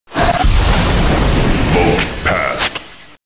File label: vote end